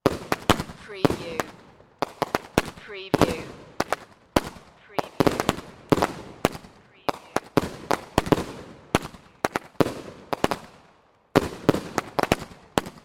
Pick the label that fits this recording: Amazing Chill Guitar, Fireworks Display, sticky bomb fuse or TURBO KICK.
Fireworks Display